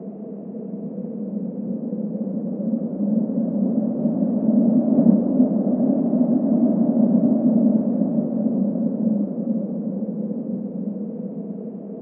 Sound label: Wind